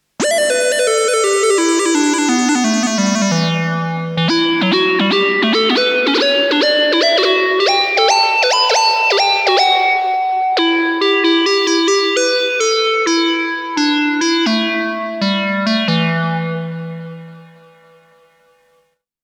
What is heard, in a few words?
ARP